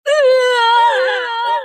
screams

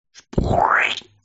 splorch

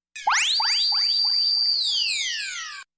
forro

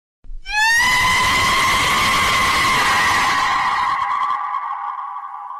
boosted up

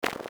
walking

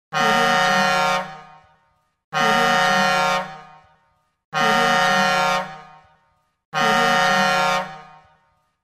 big ship horn

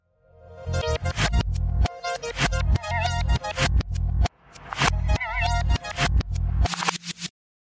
dance reversed

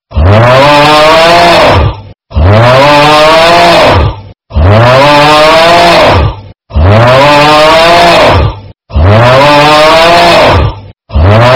haaaaaaa haaaaa

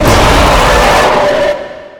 attacking; weird freddy

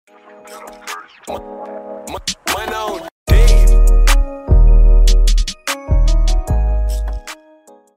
pluggnb beat

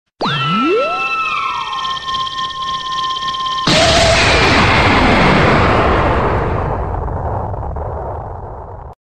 basic beam; dbz

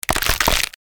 spine breaking

